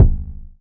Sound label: small reverb kick single hit g sharp key; kick drum samples